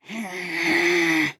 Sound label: Mage Grunt Fast; Nightshade; Realm Royale